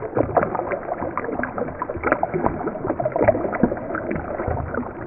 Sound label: Slow Water Loop; Drones Abstract